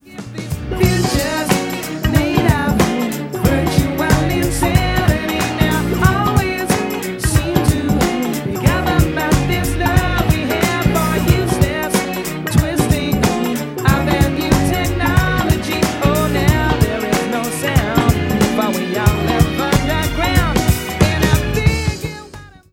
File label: music test, codec2